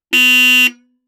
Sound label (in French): KLAXON